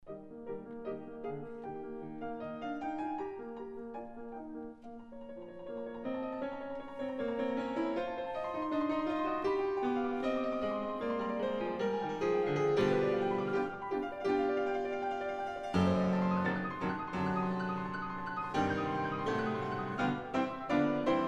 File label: Piano Concerto Allegro con brio; GBSO